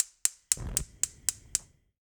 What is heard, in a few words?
Stove Gas Lighting Clicks, Household